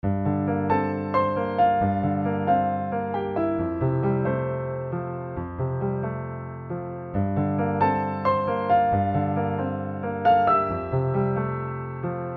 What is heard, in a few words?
piano 4hands